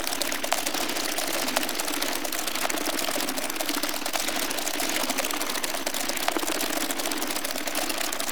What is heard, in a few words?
Loopable Mechanical Rumble